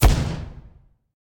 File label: fire impact; smite; zhongkui